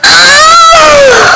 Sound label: faaahhh